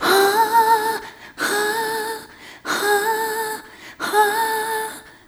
Rhythmic A

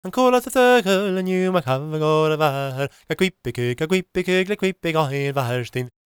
GAELIC, World Voices; ILIO; Vocal Planet

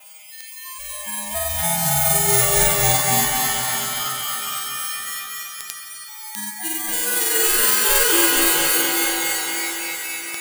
EURO TECHNO, loop; Sonic Foundry (Sony Creative Software) - Future Retro Dance Excursions

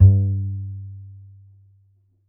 E, Upright Acoustic Bass

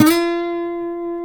F#4, Guitars; MARTIN 6; Spectrasonics; Hans Zimmer Guitars VOL-1

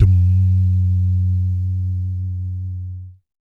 BASS DUMS; Spectrasonics; Vocal Planet; Jazz FX

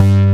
S FUZZ BASS; Pro-Rec - Synthsonic; BASSES

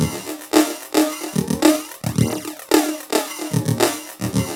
LOOP, TECHNO; TECHNO-BOX; ELEC-LOOPS

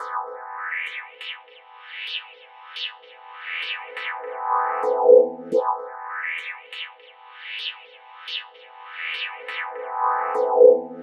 D&B Synth Riff; Keys; neuro

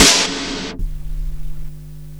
SNARE FX, Big Beat; Big Beat Samples & More; AKAI

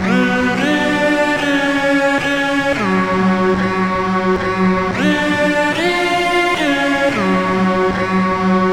Guitar Based; Guitars; Eclectic Electronica

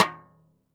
xstick - snares off; JazzFunkKit

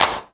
CLAP, Dance Drums

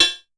hat, drum; Masterbits - Total Zone

